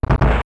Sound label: Door Double; "Double" door sound when a door opens after unlocking; LTTP